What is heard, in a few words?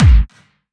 menu hit; osu skins; ThePro365